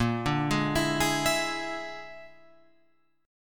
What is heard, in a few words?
A sharp 7th Flat 5th A sharp down Guitar Open F; A#7b5 arpeggiated; JGuitar